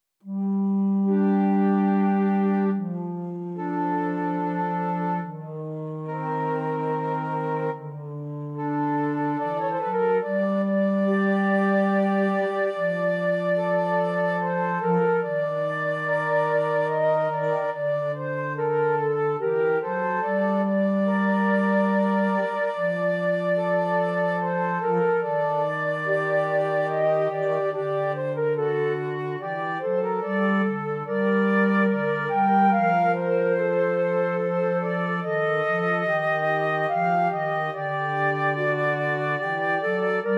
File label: Flutes